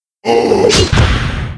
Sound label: mob raid die; Monster; 레이드; 광마부진용